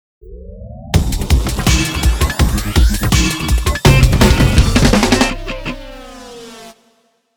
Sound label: Intro Instrumental; Digitale Stadtwerke Webtalk